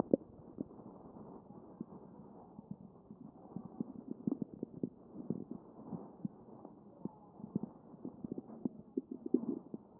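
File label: anterior chest, position 1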